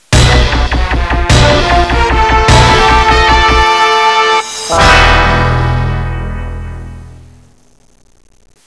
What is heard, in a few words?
axis win